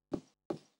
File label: steps wood; Rapid Gun; ipsc